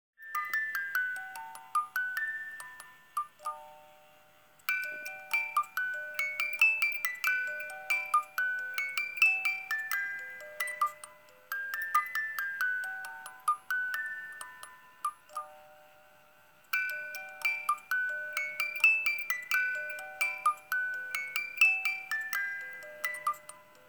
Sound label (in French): mélodie boite à musique